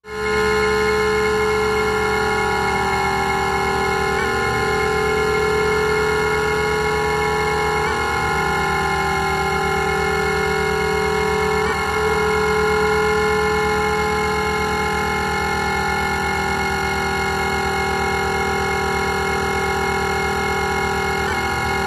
Energy Leveler, Machine, Space, Electrical Buzzing, Tension, Spinning; power core hum